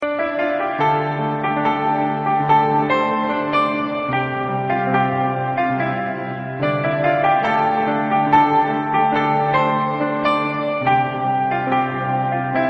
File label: nice piano